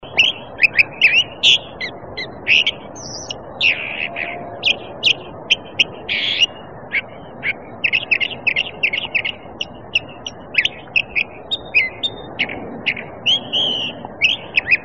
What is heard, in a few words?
California Thrasher